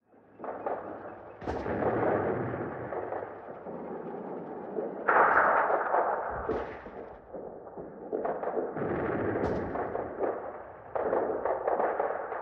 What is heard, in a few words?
Small ambient shooting; STALZONE